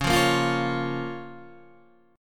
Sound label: Db 6th, Guitar, DADGAD